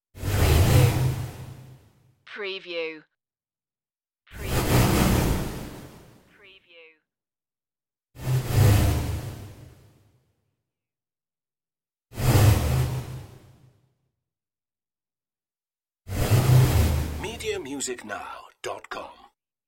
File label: windy anomaly whooshes